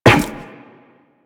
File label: Run Zipline, Footsteps; Warframe; Tenno